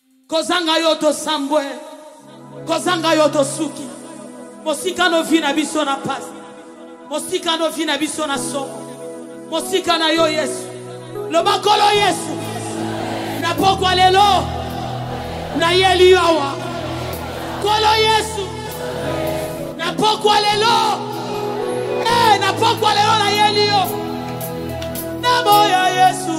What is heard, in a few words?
Concert; zambian gospel music